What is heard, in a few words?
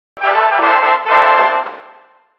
Stinger; CardSpecial